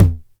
909 MT Tape, Toms; Goldbaby Tape808 & Tape909; The Tape 909

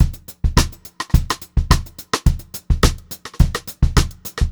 BEAT, Funk-Groove Drumloops

